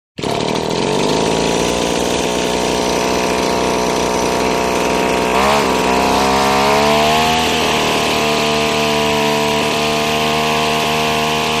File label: Lawn Trimmer Start